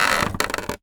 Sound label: Polystyrene Creak